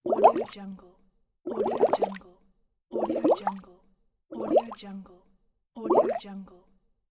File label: short water bubbles; blackdragonfx